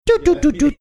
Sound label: making computer sounds